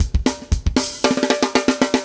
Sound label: metal